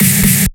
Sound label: 128BPM Kit solo; Shockwave(PIL) - Future Big Room vol 2; Massive Kit